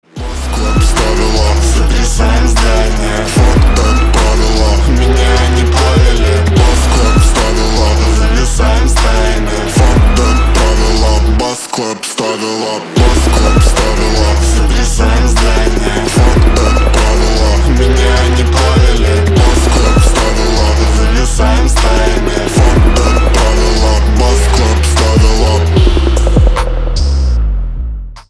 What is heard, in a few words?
bass clap